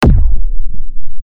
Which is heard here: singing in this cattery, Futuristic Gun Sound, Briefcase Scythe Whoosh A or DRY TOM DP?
Futuristic Gun Sound